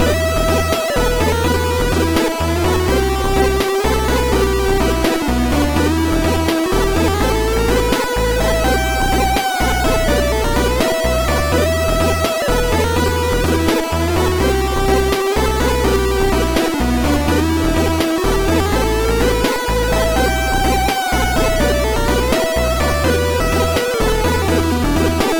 chiptune

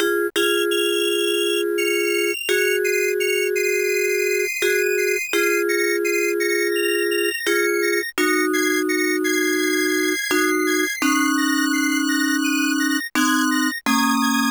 clair de lune; Deluxe Music Construction Set

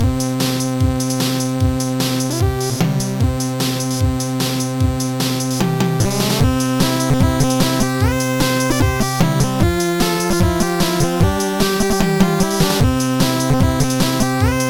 bagpipe; Fowl; Trackers; Modland

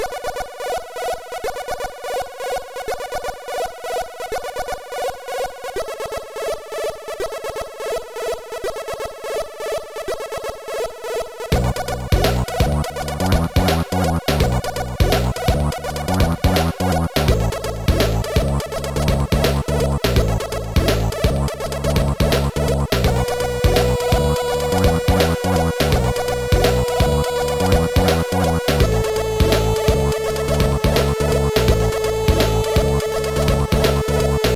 hip hop funk; Trackers; Modland; Nugget

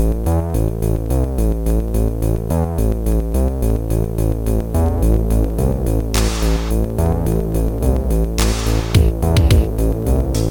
the drum song; MusicLine

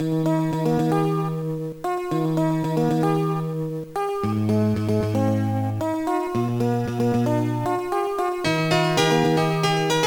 joyful morning; Symphonie Pro

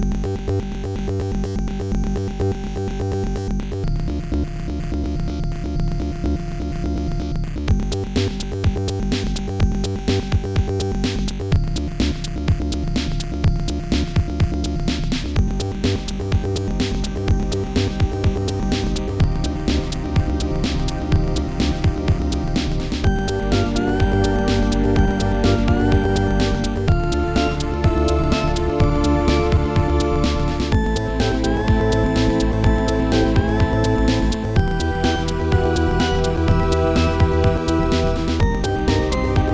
noise synth pop, chiptune

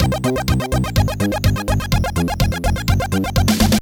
mod funky

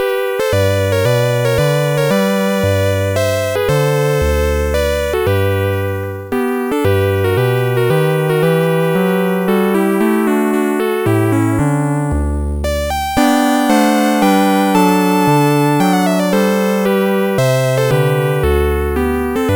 minuet; Sonix Music Driver

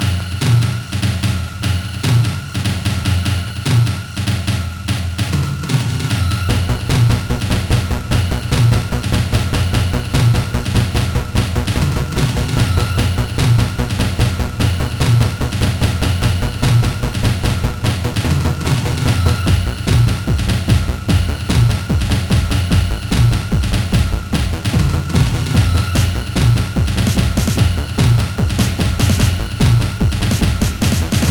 dance guitar; Trackers; Modland

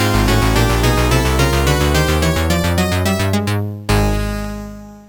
action jingle; Oil Imperium

